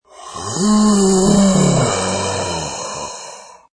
Zombie, Zombie moan: Zombie, Frankenstein monster or other fantasy creature. Moaning, groan and; breath; Horror; Lynne Music